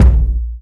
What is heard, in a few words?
short reverb kick drum a sharp key